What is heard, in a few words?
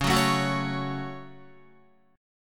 Db Suspended 4th Sharp 5th Guitar 4F,4A,5C,5G,5C,6E; strummed